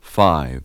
VOX GENERAL; Megabass Remix! 2

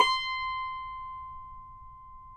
CUMBUS C, Guitars; Spectrasonics; Hans Zimmer Guitars VOL-2

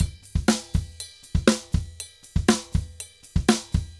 DRUMS, SALSA; World Grooves; Best Service ProSamples vol.14; AKAI

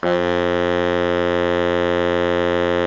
BARI MF F 1, Sax; Giga Samples Collection; BARI 3-WAY

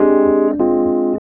E.PIANO, KEYS

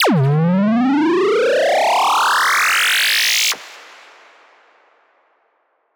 Tone Riser (C2)

